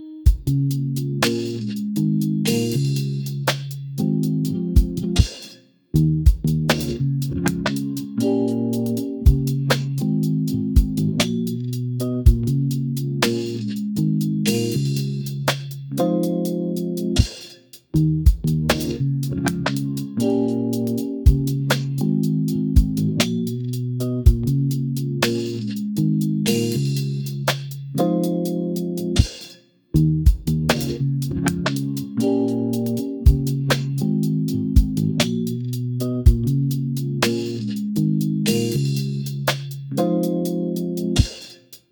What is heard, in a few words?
47 second funky guitar ri